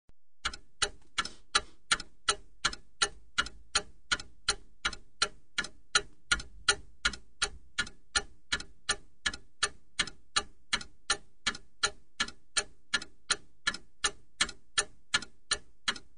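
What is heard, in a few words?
clock ticking; Тиканье часов для монтажа в разных видах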